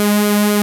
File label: CS PWM; INIS; Vintage